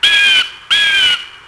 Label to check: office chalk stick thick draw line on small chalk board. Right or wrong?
Wrong